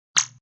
Squiggle; Squiggles

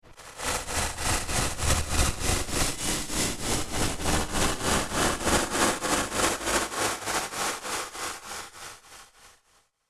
Slow Haunted Shake, ghost; horror sound effect; Andrew Fleming

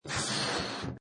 Balloon Inflating; Balloon rubbing. Rubbing, handling balloon, rubbery squeaking.; Balloon Sound Effects; Cartoons; Lynne Music

